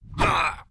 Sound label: daemon attack; propack